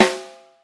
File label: verby snare f sharp key